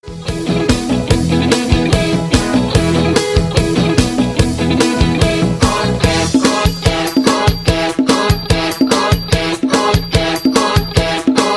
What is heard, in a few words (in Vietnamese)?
nhac chuong, nhac thai lan